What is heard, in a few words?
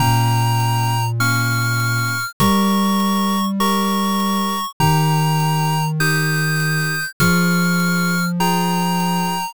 bgm mellow